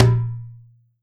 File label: MIXED DRUMS